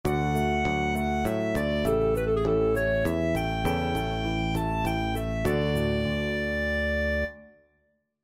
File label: texture homophonic; texture exercise thick and thin